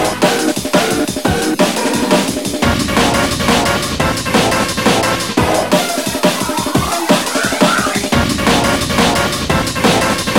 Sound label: Drum N Bass